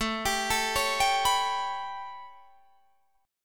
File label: A 9th A, Guitar Open D